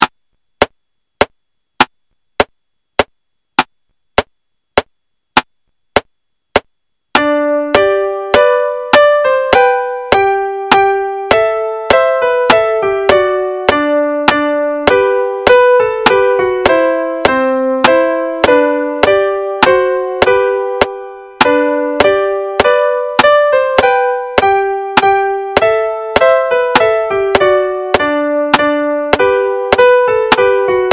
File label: Ash Grove Click